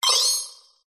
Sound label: negative game sound fx arcade casino kids mobile app